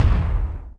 menu DOWN; Real War Air Land Sea

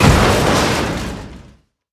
Car Damage Large; gamerip; Car Simulator 2; android

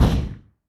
Small Explosion

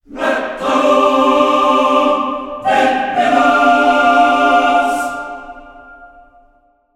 Choir Epic Short; The Dwarves 2016 ps4 gamerip

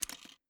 press lock; Through Abandoned 2 The Forest

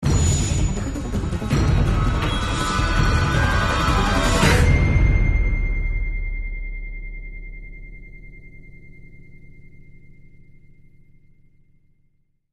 Outro stinger

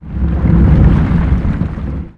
Sfx Colossus Movement

